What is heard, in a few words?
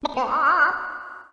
chicken; Animal Sounds; Various Animals; Short calls